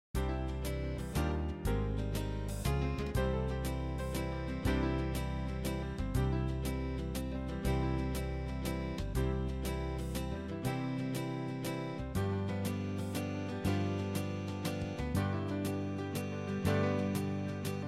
goodnight waltz

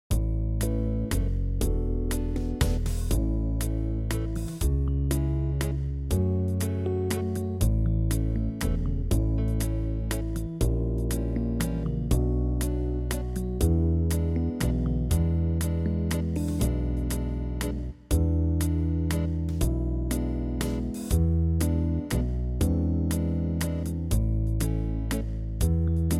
festival waltz

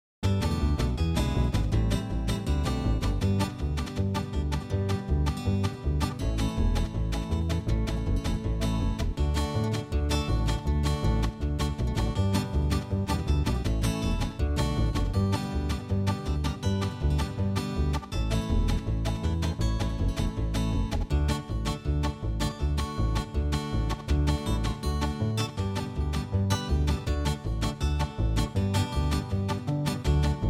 dill pickle rag uptempo

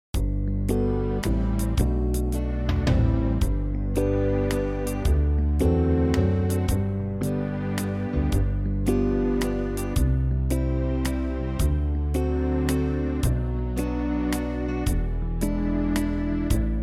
bridgets waltz normal

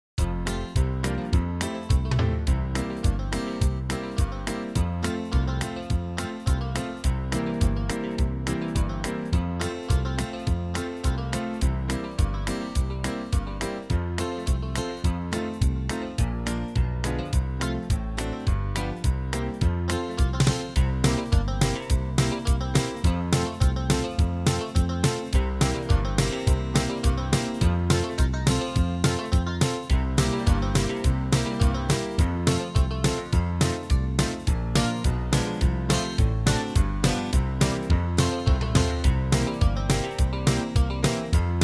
beaumont rag uptempo no break

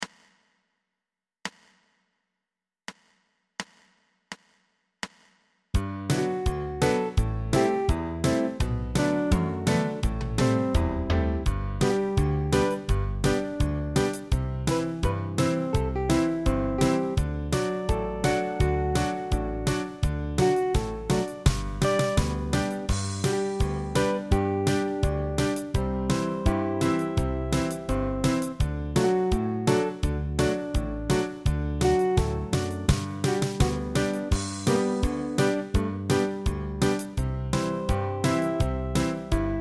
red apple rag

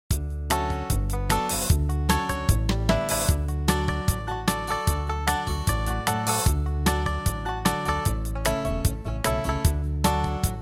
dry and dusty slow

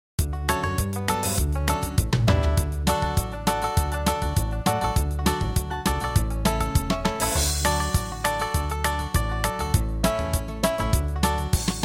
uptempo, fiddle; dry and dusty

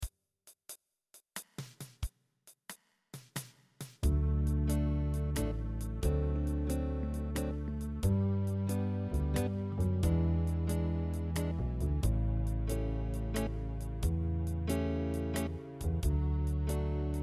whistlers waltz

